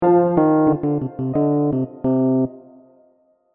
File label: bottom bridge in F